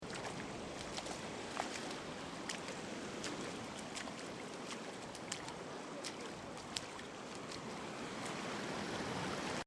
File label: Ocean Beach Sound Of The Sea Footsteps In Shallow Water; Ocean - Beach - Sound Of The Sea - Stormy Sea; CraftedSignals